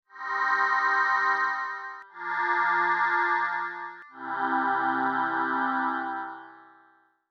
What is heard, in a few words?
vocal